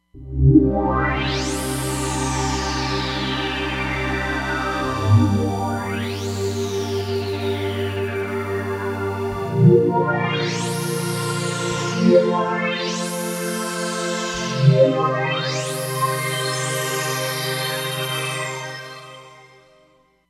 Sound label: Reverse Sweep, Roland Fantom XR